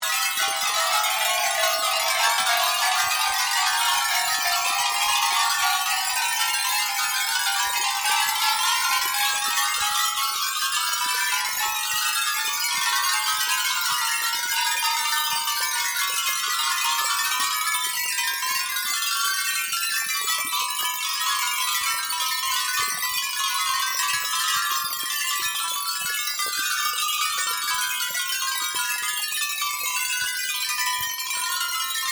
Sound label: Created Ambience Electro Tinsel, ambience created through sound manipulation; Artificial; Various ambience created through sound manipulation in FMOD